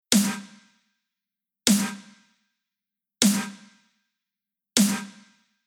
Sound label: Future Bass Rhythm Bass Snare